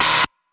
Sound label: deny